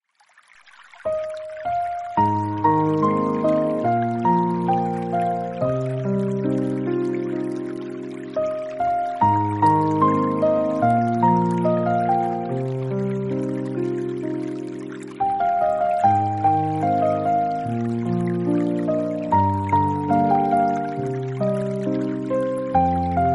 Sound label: Relaxing Piano Music